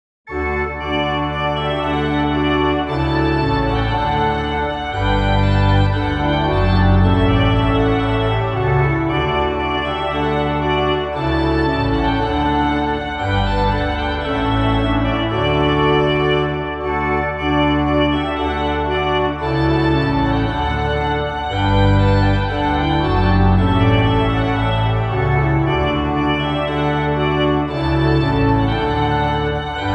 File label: Prelude Charpentier, Organteq